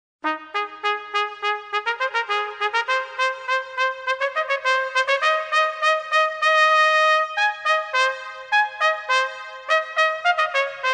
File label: Solo Trumpet